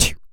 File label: Mouth; Digital Sound Factory Protean Drums